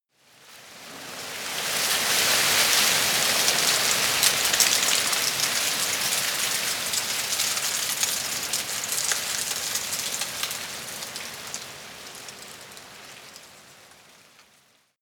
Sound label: tree leaves rustle medium